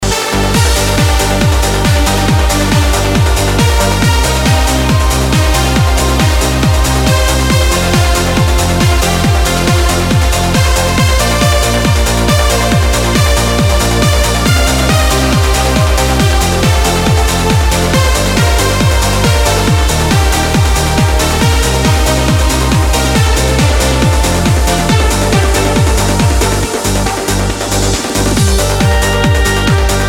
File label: classic trance remix ring